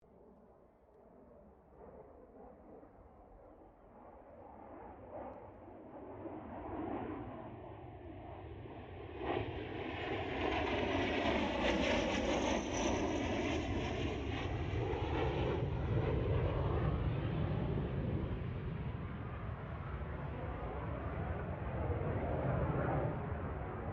AIRCRAFT CA-27 SABRE PASS STEREO; F-86 Sabre; Airplanes; Vehicles

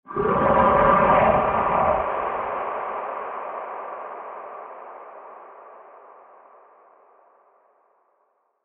Monster Noise; horror; fantasy sound effect; Andrew Fleming

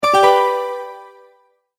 multimedia tone, Goodbye

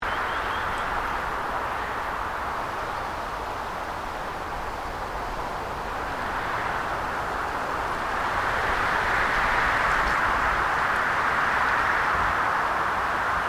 Wind Through Pine Fronds Loop; Elemental weather effects; Wind Sound Effects

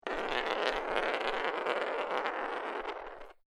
Marble Small Rolling On Floor; A small marble rolls along wooden floor.; Miscellaneous Toy Sound Effects

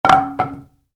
Baseball Bat: Dropped Onto Carpet; Sports

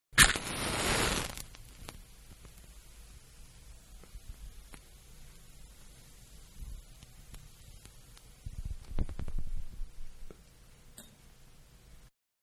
Match Wooden-Strike And Burn; Flames (Sound Effects:Objects); Manhattan Production Music

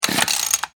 Industry Hedger Pull No Start; Weed Whackers; Machines

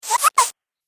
Cartoon Pumping Sound

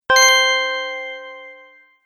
Sparkle Prompt, beep sound effects; object sound effects

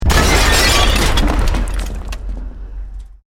EXPLOSION MEDIUM BRICK WOOD GLASS NO FIRE

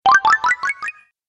Restore Health, Video Game Sound Effects

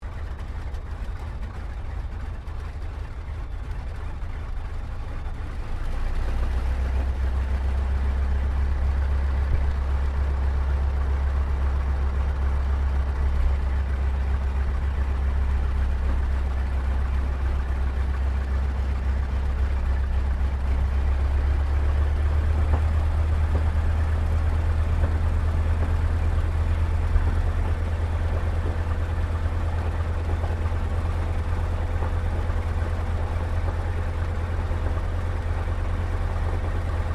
AIRCRAFT T-28D TROJAN TAXI INTERIOR; Airplanes; Vehicles

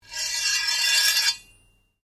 Blade Scrape Slow Ring, Sword; Foley sounds for melee weapons; Swords (Sound Effects:Combat)

